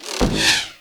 bow puny miss; wesnoth

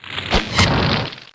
crossbow fire; wesnoth

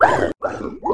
mermaid die; wesnoth

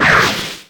magic missile; wesnoth

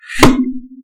spear; wesnoth

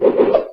sling miss; wesnoth

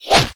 spear; wesnoth

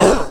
orc small hit; wesnoth

